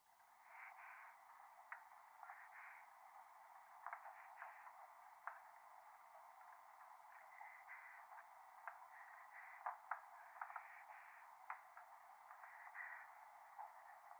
左耳密着吐息 電車

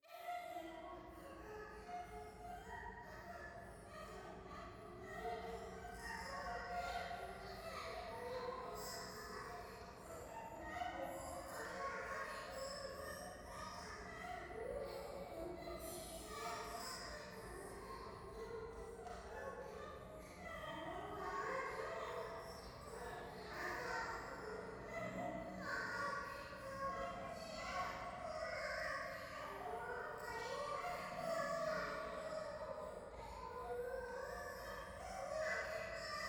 夢の中 喘ぎ 電車

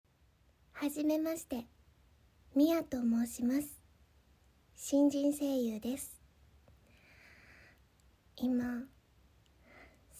実演オナニー; ガチ実演オナニー

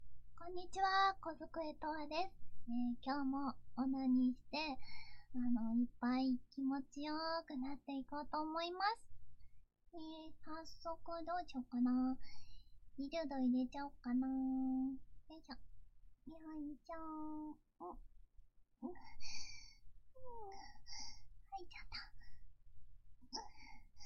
【オホ声】お゙お゙ッイグッ低音オホ声絶頂オナニー

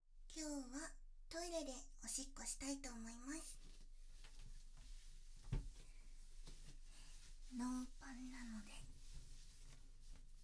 おトイレ で オシッコ です バイノーラル マイク 収録

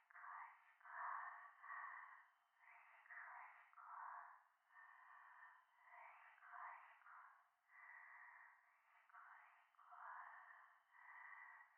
しこしこ 山奥; 夢の中

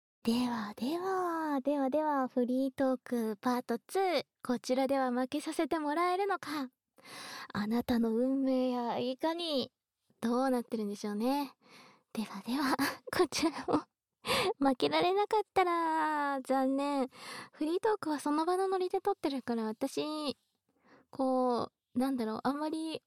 蕩ける耳舐めマッサージ; 蕩ける耳舐めマッサージ聴き比べ☆副音声

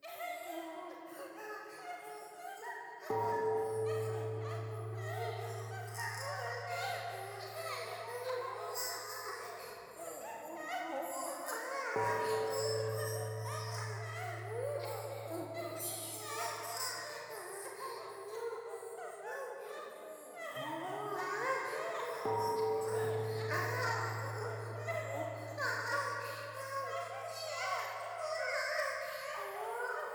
喘ぎ 神社

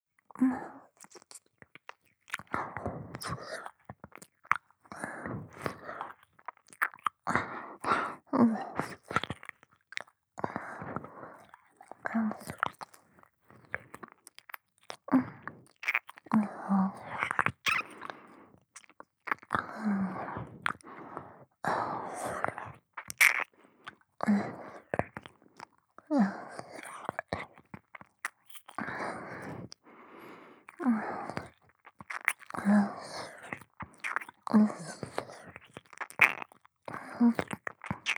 耳舐め・普通, ASMR; loop音声

